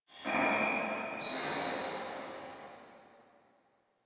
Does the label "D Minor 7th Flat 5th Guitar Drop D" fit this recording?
No